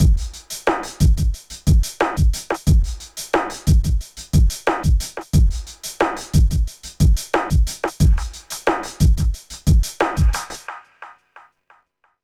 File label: Dum Dub Loop, Drum Loops Compressed; Zero-G Smoke